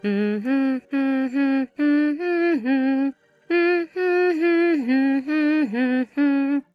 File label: hum